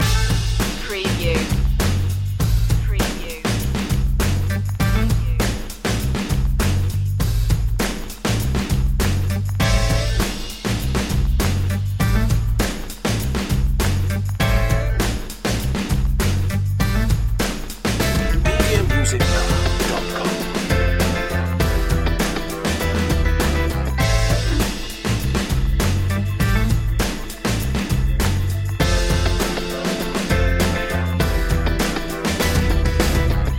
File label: funk punch loop